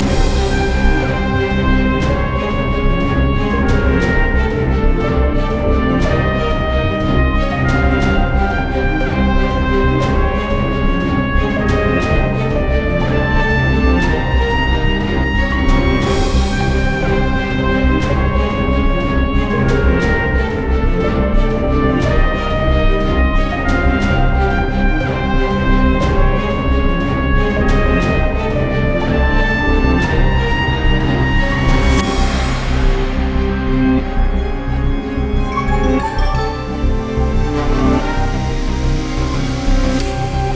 Loop; Lost Epic

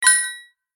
Positive feedback

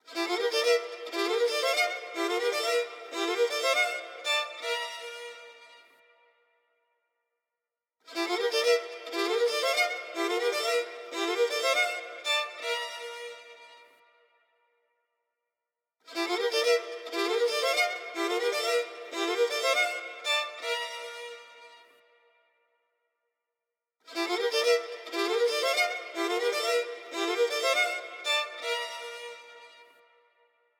Violin bridge; Raji: An Ancient Epic

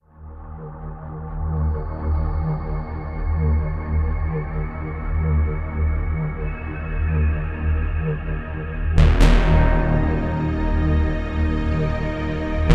Timer; Call of Duty Black Ops III; PS3; Xbox 360

